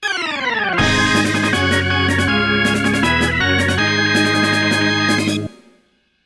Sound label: Jingle ~ Homerun!; Mario Super Sluggers; Wii